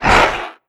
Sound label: grunt soft, antlion; creatures; vox